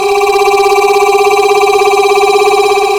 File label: conveyor belt; Platformer